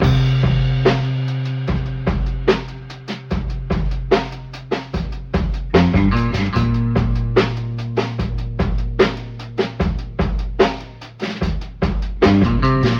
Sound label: guitar, funky drum loops